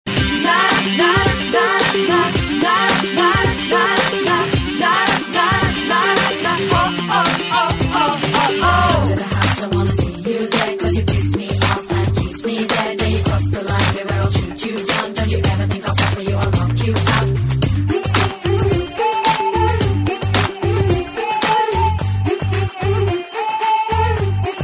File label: Happy Telugu